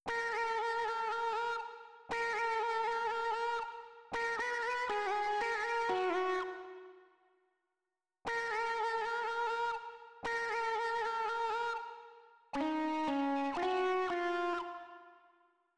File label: lead synth